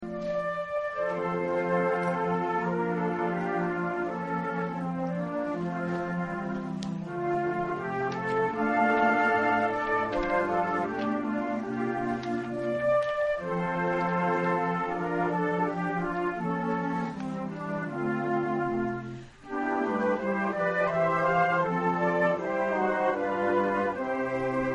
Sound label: concert, flute; AUTUMN concert 2016